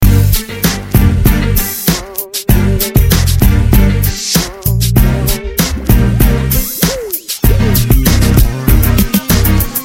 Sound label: Hip Hop